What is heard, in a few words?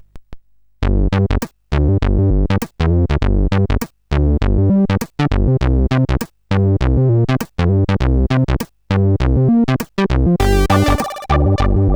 sid CSG8580R5